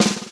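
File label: groovy snare drum; Funk-Groove Drumloops; USB Soundscan vol.38; AKAI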